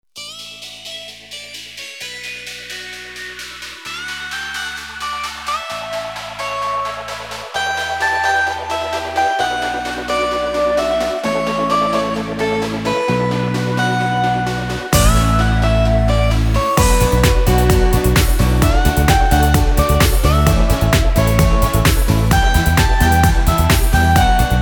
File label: instrumental, persian